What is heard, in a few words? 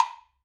Acoustic Percussion One Shot